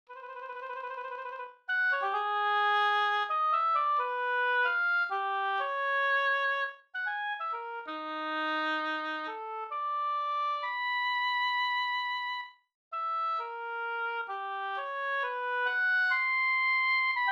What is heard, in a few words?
Oboe Solo